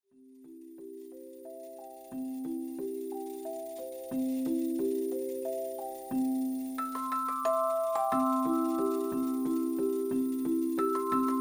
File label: Music Box